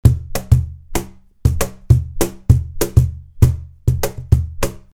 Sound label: cajon loop 100bpm; creaktor; mozee